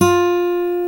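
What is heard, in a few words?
F 3 MARTIN, acoustic guitar; AKAI S6000 CD-ROM - Volume 1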